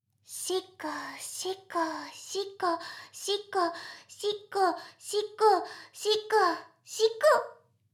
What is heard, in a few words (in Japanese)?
しこしこ; 全妹計画２; メスガキ妹; 全妹計画<オール・シスターズ> 2妹め